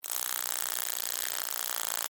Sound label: Insect Rattle Angry, Bug; Animal; Insect Movement; BlastWave